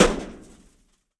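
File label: bullet metal; 3D Sound - 3D Keys VOL-1; MEAN 3D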